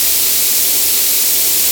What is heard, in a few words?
steam loop